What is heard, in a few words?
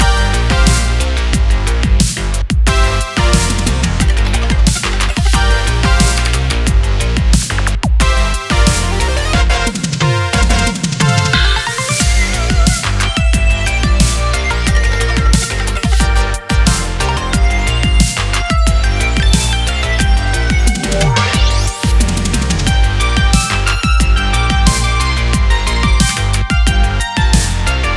Boss Gen Loop; Galaxy of Pen & Paper